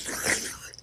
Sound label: Suck